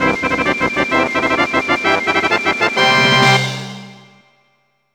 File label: World Puzzle Complete Fanfare; Banjo Kazooie